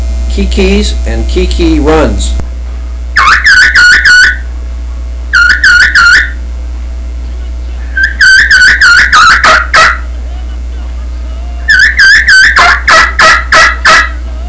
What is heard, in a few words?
keekeekeekee run, turkey; Southland Sounds